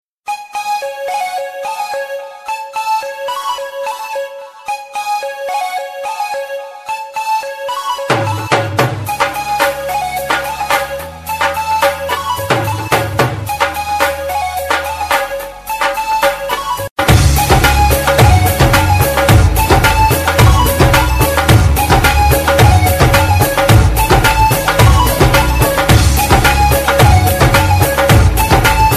flute

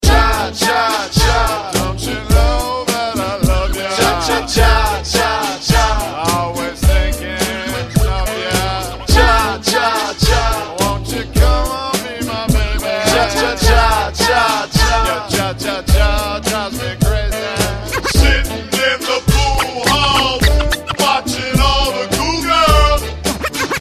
cha cha cha

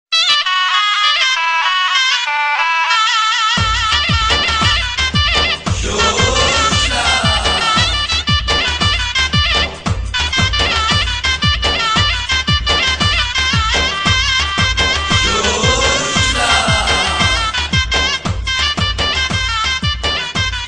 kurdish; nokia

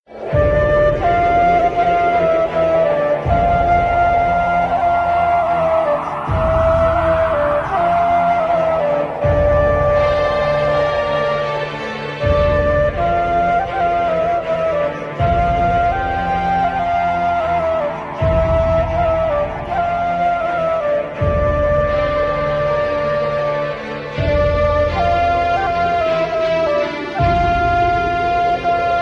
ashura music

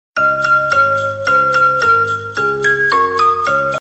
jingle bells short

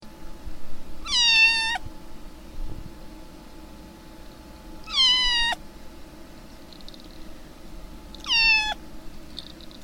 kitty meow

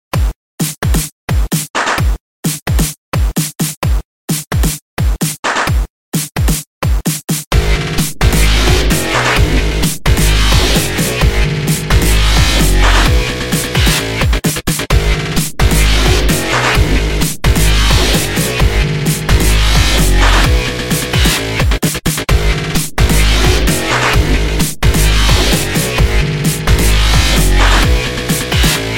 hit the beat

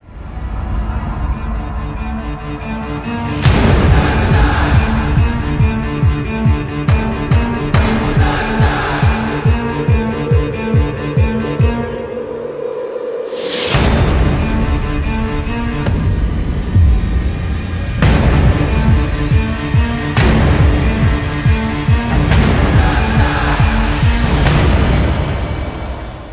orchestral theme trailer version; halloween kills

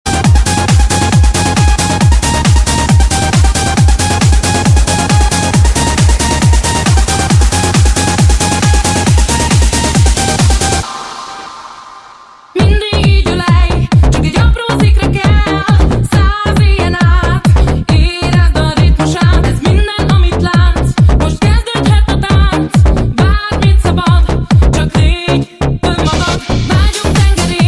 retro disco

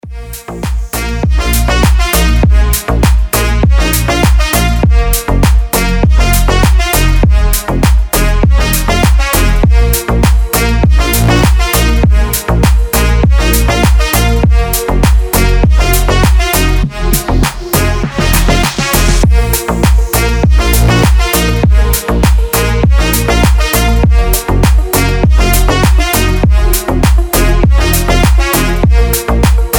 techno

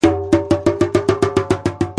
tablas notification

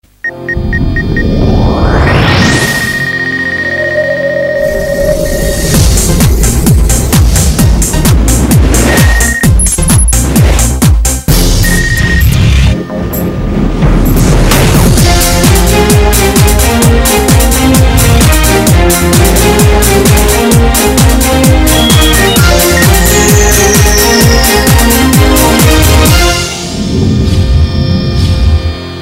electronic

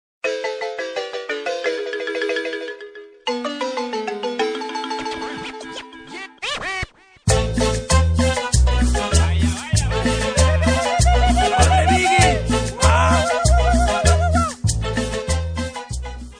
la marimba

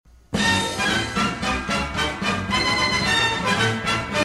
deck the halls

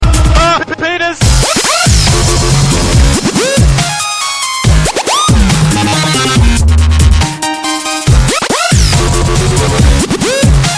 dubstep

